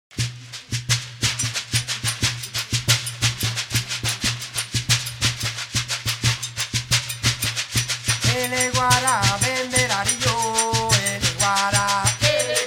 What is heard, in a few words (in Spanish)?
Guiro para Elegua, Musica SONGO